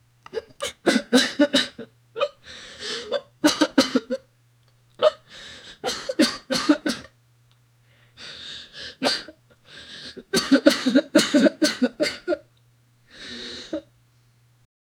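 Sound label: harsh rattling cough masculine; injury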